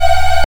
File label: Sandblaster F#4, synths; Multisamples; Ableton Live 12 Lite; Core Library